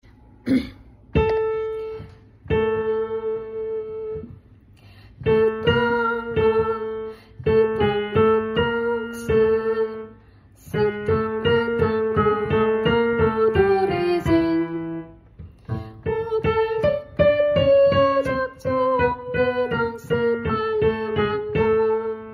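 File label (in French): le tango soprano et tenor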